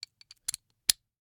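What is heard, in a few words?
Ratchet, vanadium steel, 8 in, standard, locking, clicking, mechanical, new, socket lock, fumble; Eiravaein Works; Vaeyan IV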